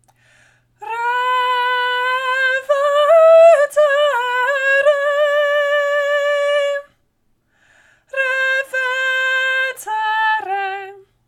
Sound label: Revertere strophe tutti sop